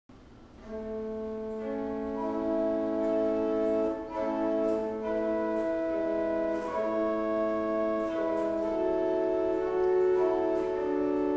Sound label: Viola da gamba 8, Tannenberg Organ; Gemeinhaus Saal - Old Salem, North Carolina